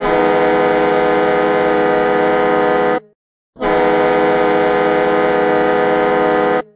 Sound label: Hockey Goal Detroit; Hockey Horns & Buzzers